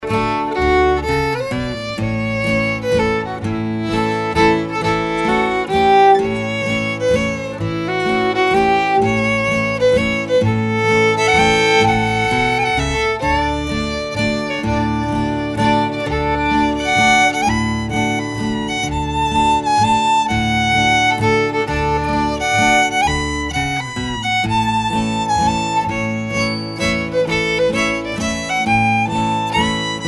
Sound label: waltzes